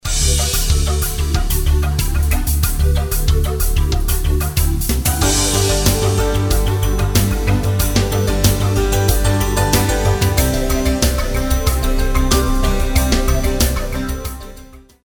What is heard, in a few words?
musicbed sample, contemporary